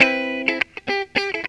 GTR, GTR RIFFS, Funky Elements; Zero-G

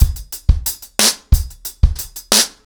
90BPM, acoustic drums, drumloops

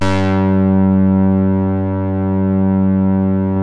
EMU MODULAR, modular syn; Sonic Reality; Vintage Timetraveler

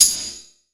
Perc, Gated Reverb; musicradar

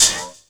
PERC, 170 BPM; Ueberschall; Drum & Bass Resonance

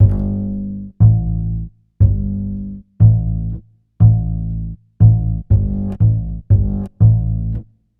Upright Bass, Ukraine Gypsy; Sonic Foundry; Continental Drift Aftershocks

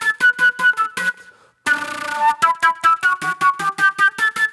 Agro Fusion Flute; Sonic Foundry (Sony Creative Software); Manhattan Flutes; Garage Funk

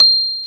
PNO a0, Rhodes; suit; Keyboards of The 60's and 70's; Classic Rhds

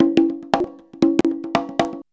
CONGA 119

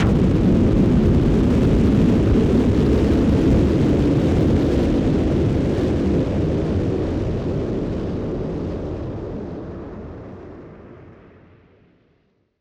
Noise Bomb Boom Filter; Noise Bombs; musicradar; bomb factory

